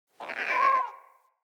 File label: Trapped Beaver Call; Encounters; Animal Rescue